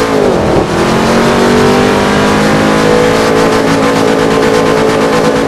second, vehicles cars chevelle ss; tdmcars

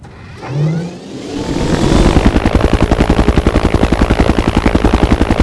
Heli Start

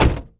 vehicle door close; vehicles

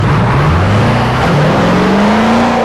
first, vehicles cars h1; tdmcars

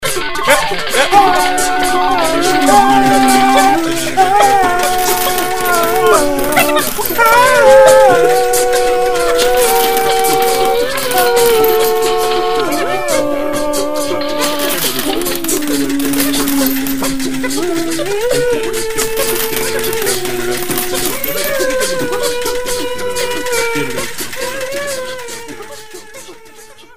you win forever, casino slots

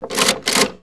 slot pull; casino; slots

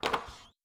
stall open

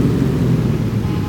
Stop, vehicles, impreza; tdmcars

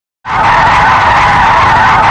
med skid, tires; tdmcars

